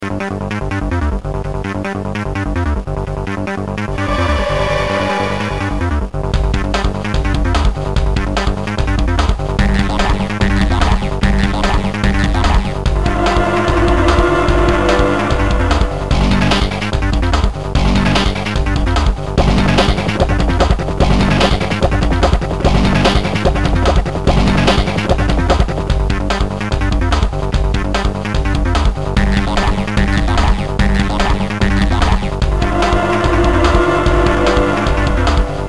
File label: alert theme; Splinter Cell; GBA